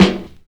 tight low end snare drum sample F sharp key

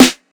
dry snare b key

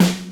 boom snare sound f sharp key; Mono

